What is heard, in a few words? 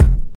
deep kickdrum f key, steel kick drum, Loudest frequency: 159Hz; kick drum samples; single hits one shots